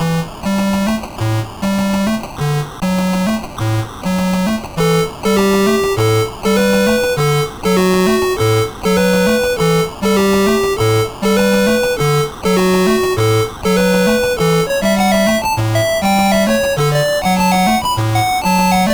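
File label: MOS 8580; CSG8580R5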